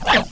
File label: pit drone communicate; svencoop